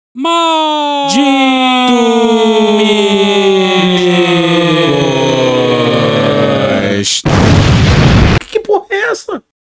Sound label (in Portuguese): bomba explosão; que porra é essa